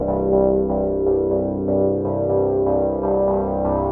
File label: 122 BPM Pads Cmaj Amin Tonic C, ambient synth pads